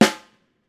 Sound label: STR. SNAR, Steel Percussion; World Combos; E-MU Producer Series Vol. 7 – Old World Instruments